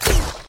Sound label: dry fire; robotwin